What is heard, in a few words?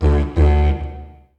DIGERIDOO; Best Service; World Colours